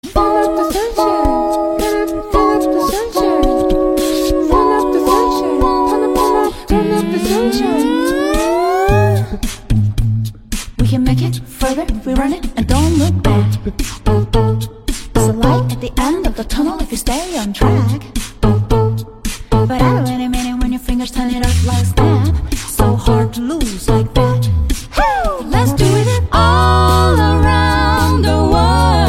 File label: Acapella; Minions